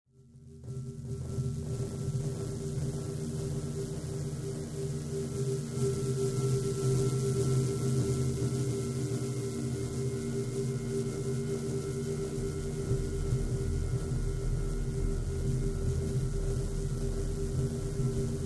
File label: cicadas